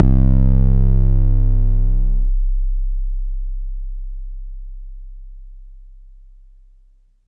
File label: BD